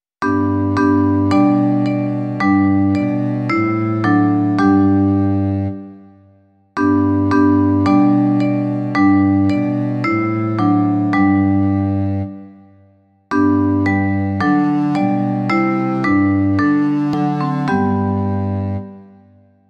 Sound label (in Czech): vibrafon a kontrabas; Kriste, jenž jsi světlo; Imaginativní verze